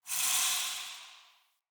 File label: Fey Mist Heal; Powers